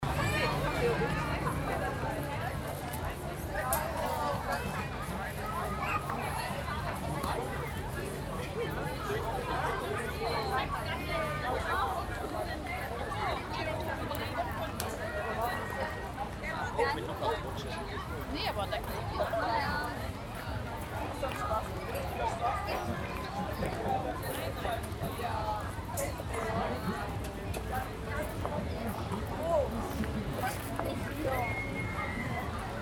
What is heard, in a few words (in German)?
Rummel Menschen Gemurmel